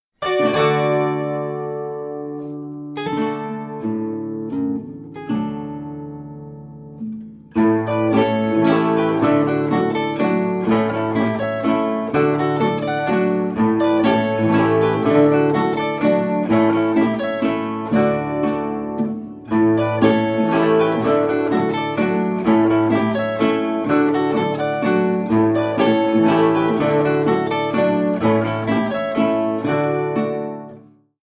Oberbayerische Landlerische

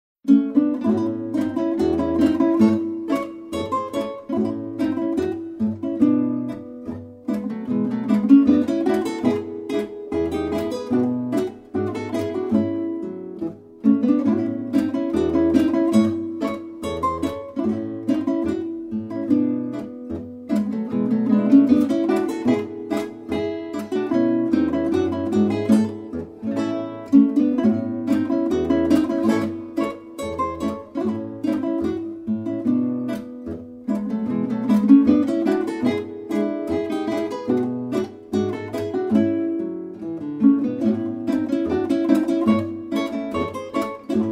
Gitarrenmusik

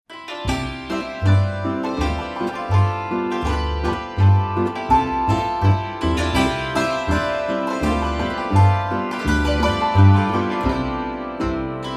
Hackbrettmusik Boarischer

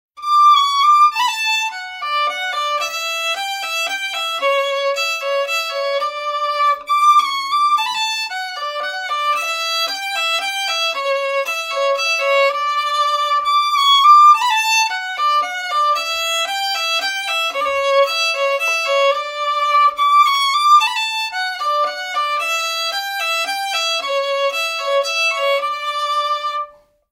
Melodie, volksmusik

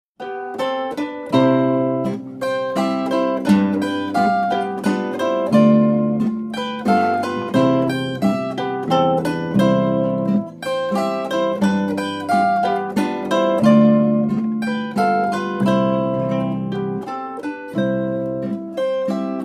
Landlerweisen

